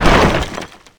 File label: Spikes Wood Emerge; SFX Kit; Foley - Misc